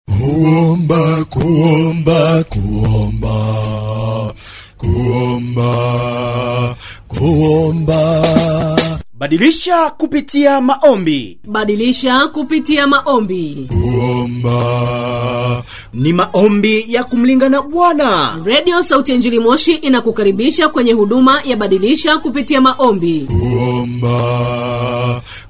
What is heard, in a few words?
JINGLE; BADILISHA KUPITIA MAOMBI